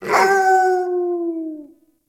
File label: Kubrow Tame Idle Howl